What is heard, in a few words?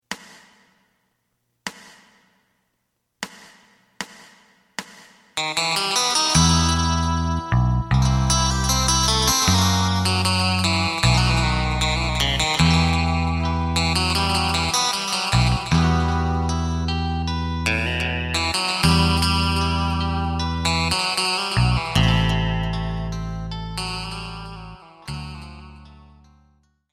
drum